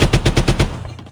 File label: 6 shot